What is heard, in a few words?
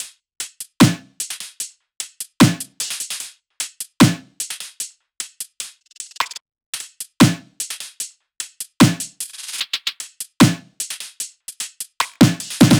loop top 150, Seismic Loops